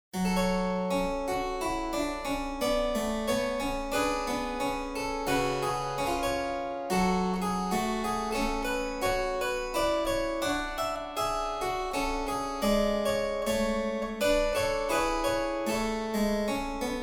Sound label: Praeludium g moll; Mietke; Bach